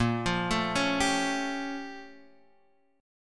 A sharp Minor Major 13th, A sharp, down, Guitar, Standard; arpeggiated